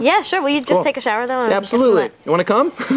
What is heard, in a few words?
squeal; mtvlive